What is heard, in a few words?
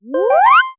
power up